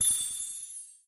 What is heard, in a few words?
rainbow pickups; Teen Titans Go! - Raven's Rainbow Dreams